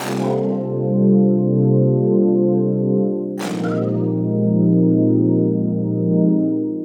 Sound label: Pad Soul Chords, Drum N Bass; Keys; Sonic Foundry (Sony Creative Software); Knutrix Drum & Bass